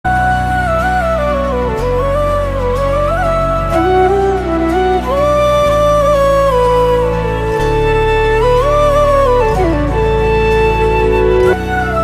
Instrumental BGM; RX 100; Telugu

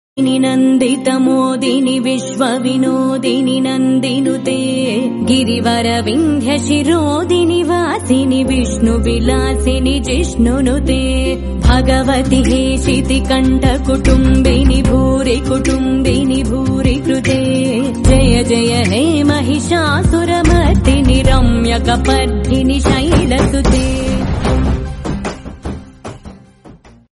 Aigiri Nandini Mahakali Bhakti Song Ringtone; Devotional

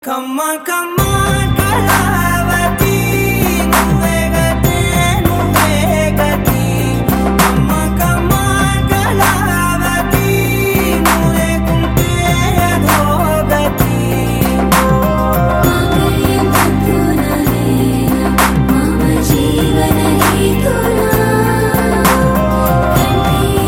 Song Bgm Ringtone, Telugu; Sarkaru Vaari Paata

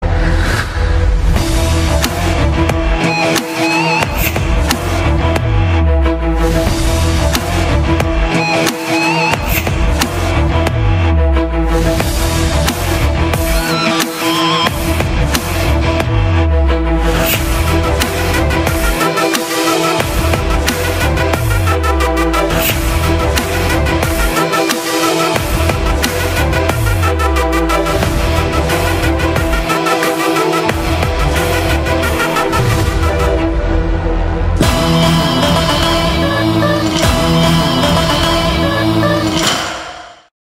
Villain BGM, Telugu

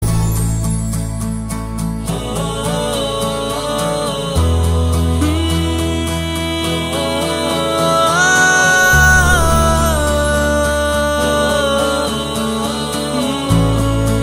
Humming Ringtone

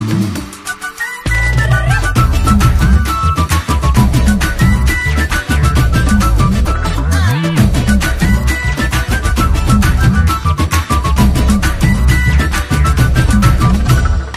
Instrumnetal Flute Music Bgm; Varun Doctor; Telugu

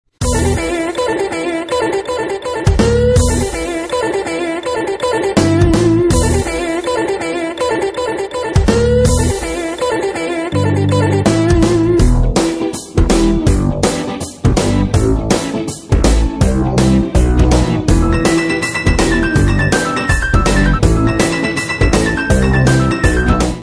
BGM Ringtone, Telugu; Venky Mama

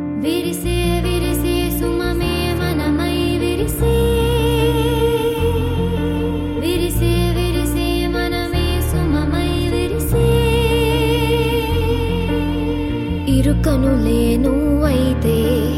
Female Version; Telugu

